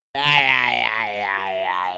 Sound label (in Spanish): jajajaja